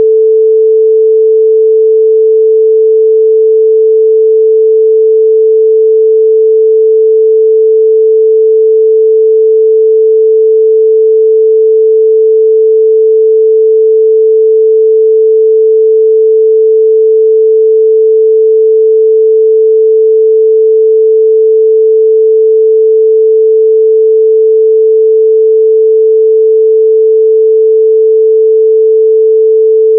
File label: A 440Hz no clipping